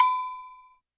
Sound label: Agogo L; YAMAHA RM1X